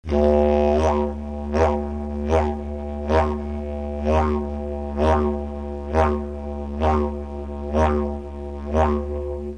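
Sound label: cheek pop, didgeridoo; Controlling The Tone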